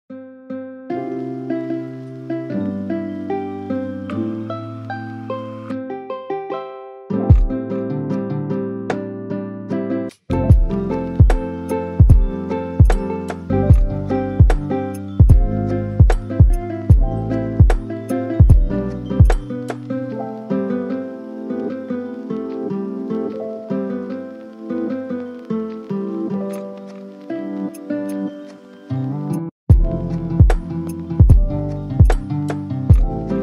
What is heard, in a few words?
lofi; Dr. Stone